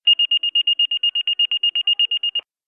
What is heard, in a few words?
beep